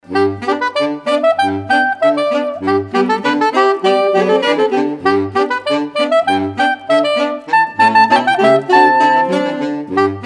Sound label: whistling, sax